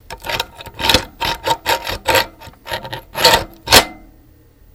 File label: Handle Bars Pull Off, scrapes